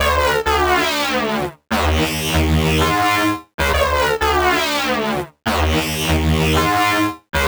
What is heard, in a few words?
128BPM Tribal Kiss Melody; VFH1 128BPM Tribal Kiss Kit